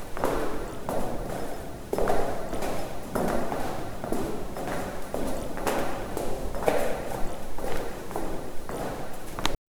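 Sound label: footsteps echo silk rustle; hall reverb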